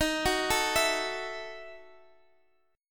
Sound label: E Major 7th Suspended 2nd Suspended 4th D sharp